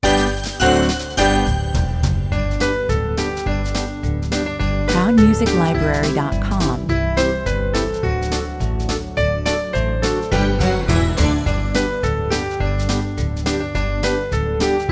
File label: jingle bells pop 88 bpm